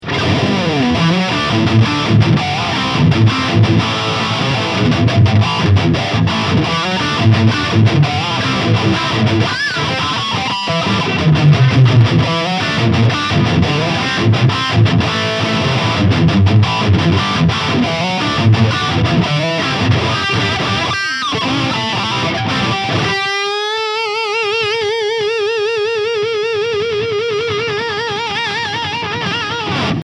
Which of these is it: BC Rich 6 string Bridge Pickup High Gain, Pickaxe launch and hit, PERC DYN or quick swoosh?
BC Rich 6 string Bridge Pickup High Gain